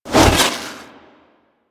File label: Trooper Melee Whoosh; Warframe; DE; Sentients